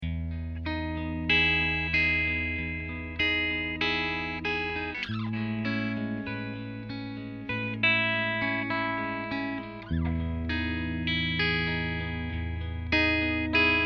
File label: Jazzmaster pickups